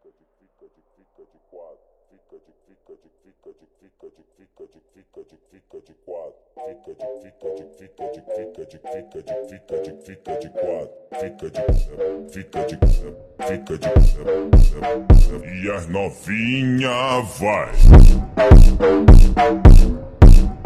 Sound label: montagem de funk